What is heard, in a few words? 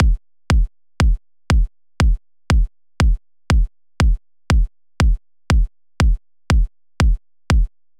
Kick